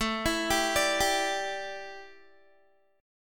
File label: A 7th Suspended 4th, A, down, Guitar; A7sus4 arpeggiated